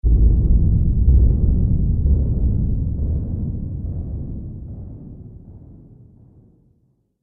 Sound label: Drum Hit Trailer; Hit Sound Effects